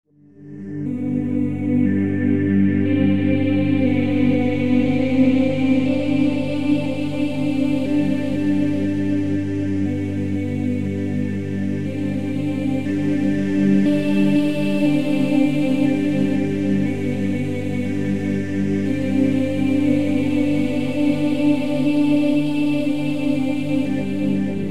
Christmas Song

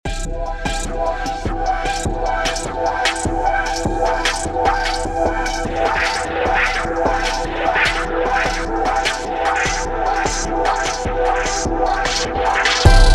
fragmented fx

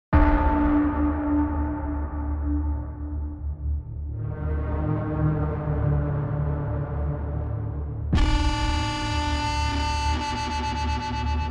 amped mangled guitar; sampletraxx

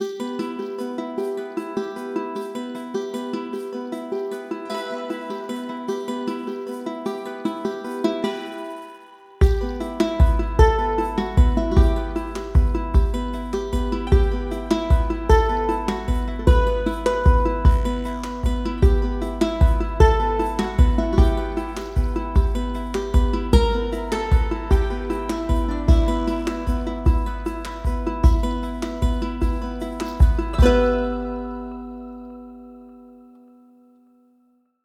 ronroco preset; tribe instruments

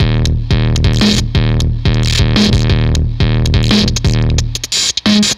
Fuzztest drum broadcast

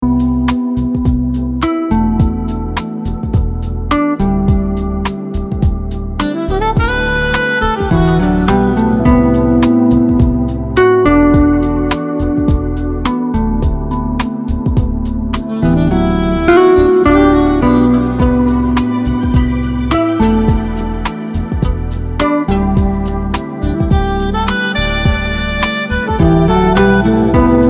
slow burn, smooth jazz guitar; audiolounge soft

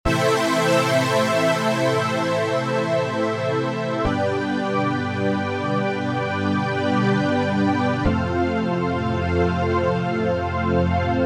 sustained filter sweep; foxfire; chiral audio

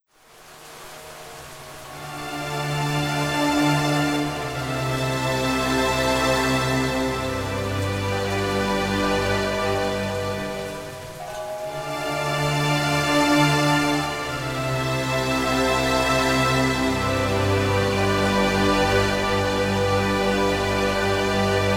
big strings, horror synth; monstrous horror synth; by super synths

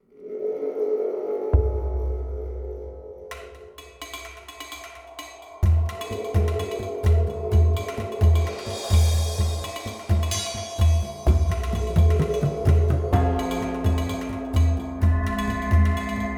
repercussions; trees

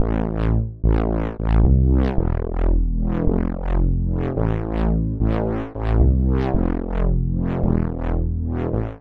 bass; orbinator; audiodev blog

